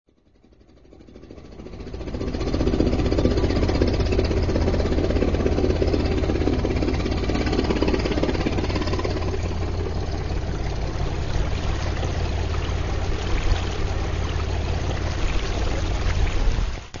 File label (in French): ambiance ferry boat helico